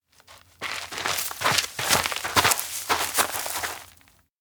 steps outdoor forest hurry walk group; anemoi

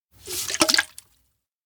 canteen offer; aino; anemoi

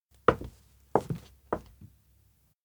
steps indoor new hall walk; awayuki; anemoi パッケージ版 1.1

